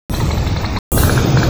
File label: trill, degu